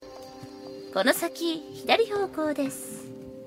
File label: polka